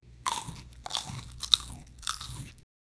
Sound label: eating